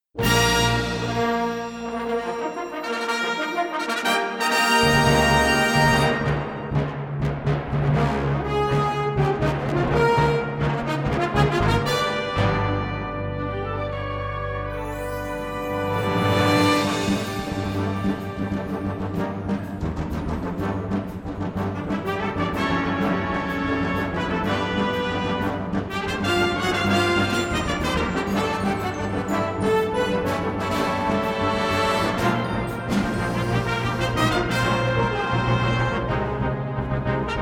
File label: wind fanfare